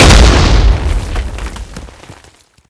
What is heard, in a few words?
explode